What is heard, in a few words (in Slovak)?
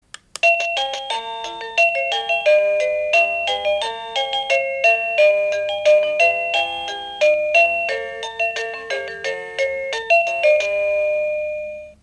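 Bezdrôtový zvonček melódie ukážka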